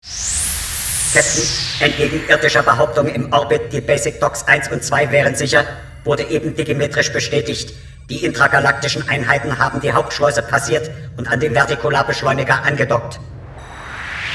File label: Science Fiction